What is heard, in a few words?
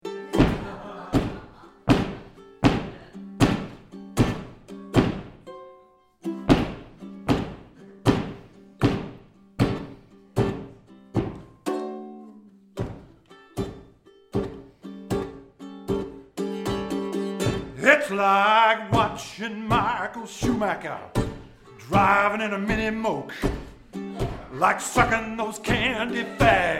A small blues song